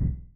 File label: ui bloop; grunk